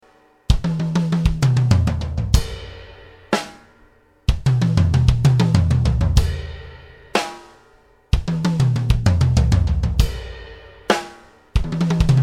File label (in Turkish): Bateri